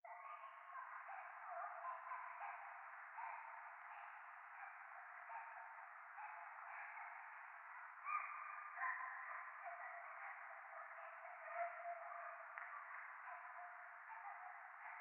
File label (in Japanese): 廃墟 可愛い喘ぎ 雨